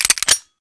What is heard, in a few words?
pistol pickup